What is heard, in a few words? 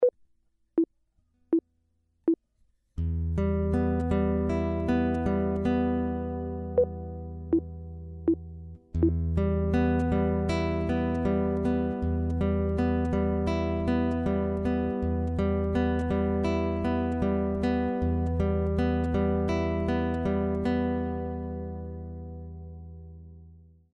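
chord, guitar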